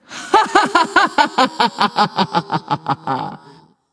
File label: "yay"; smite; voicepack; nuwa